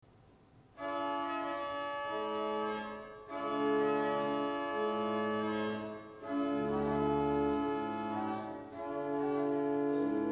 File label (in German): Gambe 8, Orgelstimmen; Orgelstimmen CD1